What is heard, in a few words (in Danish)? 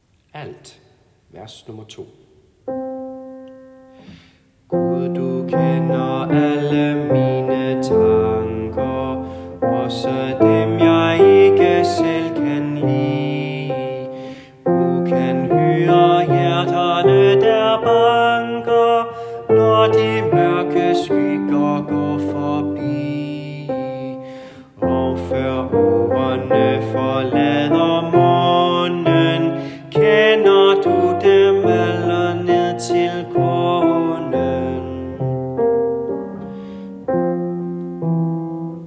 Gud du kender alt; laudate kirkens folkekor